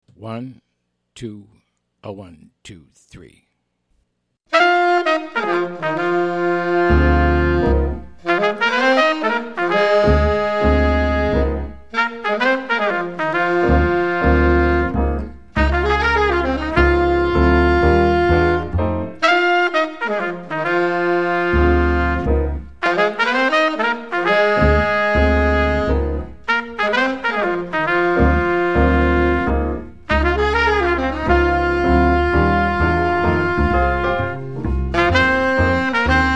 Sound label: minus Drums; sticks throughout; count off sets up the melody